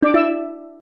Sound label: gameover; hexa blocks